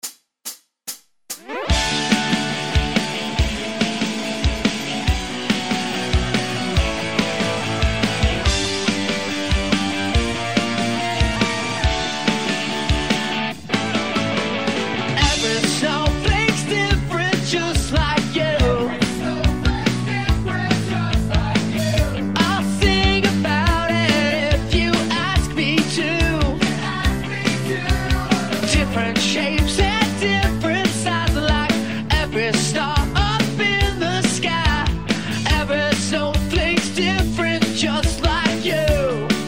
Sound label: every snowflake no bass; xmas